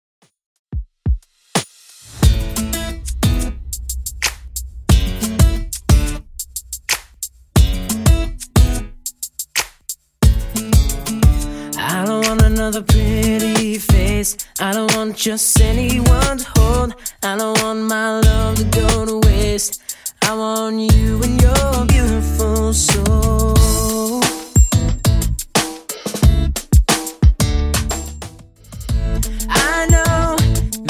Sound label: 2ks Redrum 90bpm